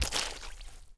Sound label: Animal Foot Large Mud; Civ4 warlords